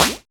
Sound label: Snare; Vengeance Samples